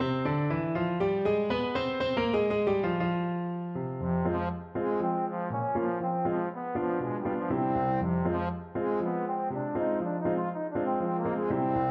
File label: freylekhs, trombone